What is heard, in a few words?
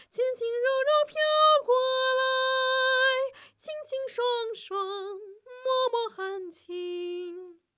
Soprano